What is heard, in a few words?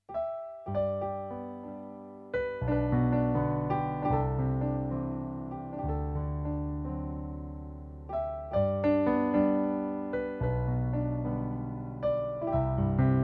Auld Lang Syne piano